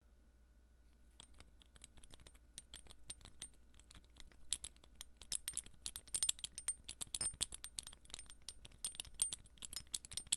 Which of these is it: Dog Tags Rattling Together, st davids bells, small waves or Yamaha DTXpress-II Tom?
Dog Tags Rattling Together